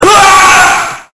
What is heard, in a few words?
SCREAM